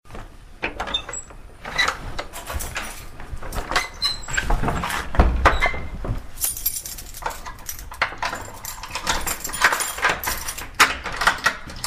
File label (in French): Ambiance rentrage chez soi; bruitage